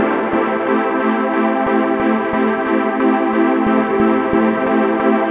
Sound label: Chords Loop